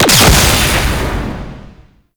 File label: Mine Explosion; Tau; Twelve Minute War